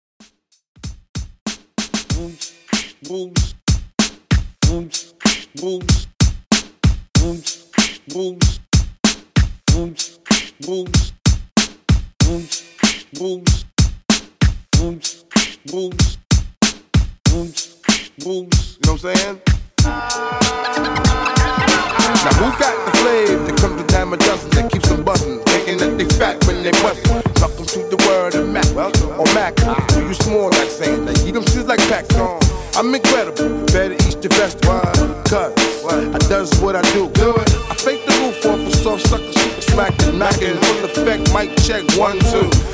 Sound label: 90s Redrum 95Bpm